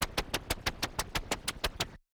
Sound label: triplet noise 123bpm, foley; Crowdsourced Sample Pack; TRUTH-HZ